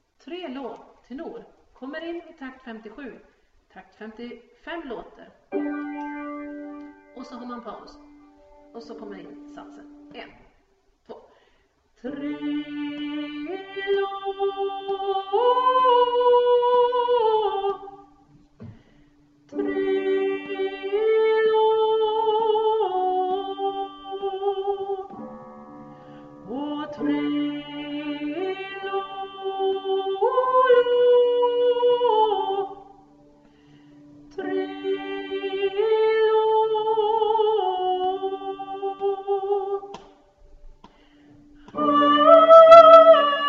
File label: tenor